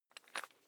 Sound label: electric drill bit fasten wrench turn fast